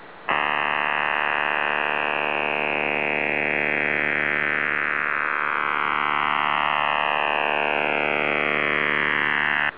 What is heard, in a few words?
CIS CHIRP; RUS-CHIRP